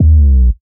kicks a lot, Kicks

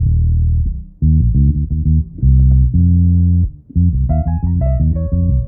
bass, Loops

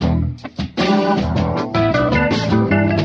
keys, phonky loops

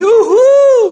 hoo hoo; Voice; ooh ahh

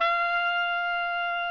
oboe; Winds

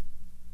line noise, Vinyl

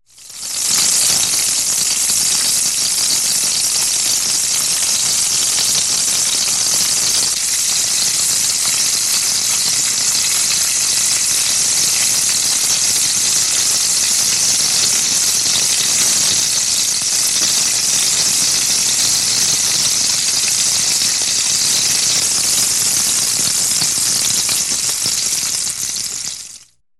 frying

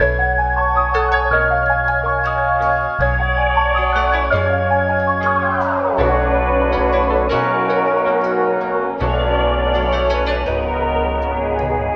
piano and geetz relaxational, Phonky loops

